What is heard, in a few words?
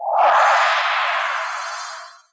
holo off, technology federation